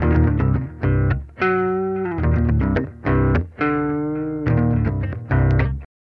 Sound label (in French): guitare claire